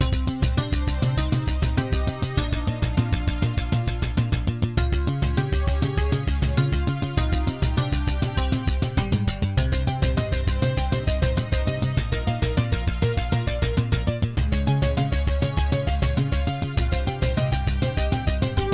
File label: intro terratec db wavex; Terratec WaveXTable; soundcard test; Hocus Pocus